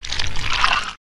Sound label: slime